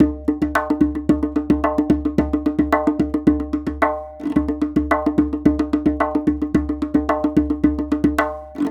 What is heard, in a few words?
perc loop tombak crusher